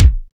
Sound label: BD JOINY; drum; BASSDRUMS